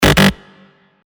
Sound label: buzzer